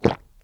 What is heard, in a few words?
pickup orb; qwokgames; tanew